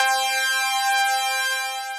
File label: Leady Keys, Synth Keys